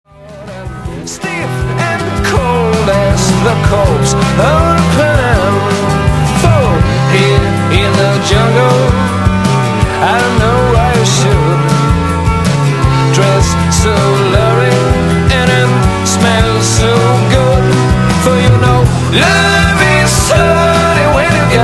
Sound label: bossano